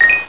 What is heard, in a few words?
coin; GAMECUBE; SUPERMARIOSUNSHINE